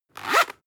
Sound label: zipper close fast